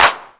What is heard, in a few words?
CLP, Korg EMX-1, Drum Machines